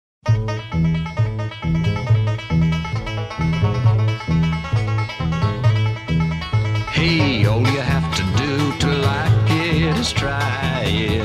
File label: Dr. Pepper Jingle - Banjo Dr. Pepper